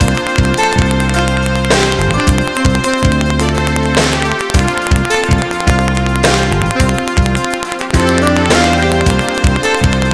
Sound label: Verse (Horns); Sound Factory; Untold 1000s